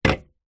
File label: food drink can soda full down on wood surface; zapsplat